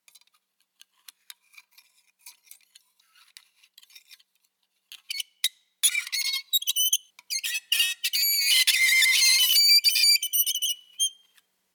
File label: Razor Scratch On Glass